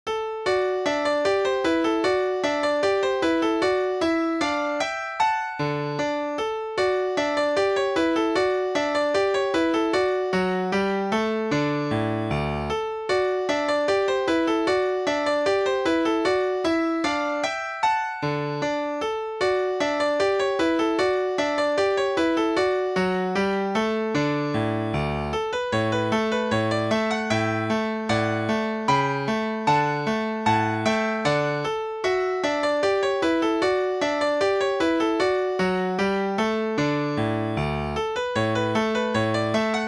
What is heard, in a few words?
English Dance